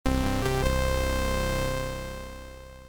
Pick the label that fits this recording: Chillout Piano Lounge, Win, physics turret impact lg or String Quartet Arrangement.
Win